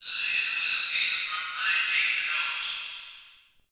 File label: subway